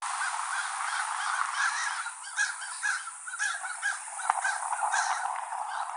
Verdone comune, Chloris chloris, European Greenfinch; Aves